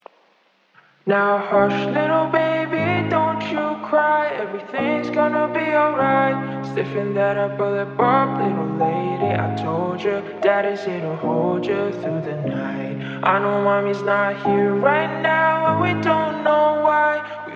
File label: Slowed + Reverb